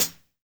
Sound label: LOFI HH, JUNGLE KIT; Drums In Your FACE!